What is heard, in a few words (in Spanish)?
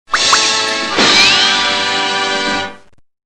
Fanfarria; Abrapalabra La Magia de Aprender a Leer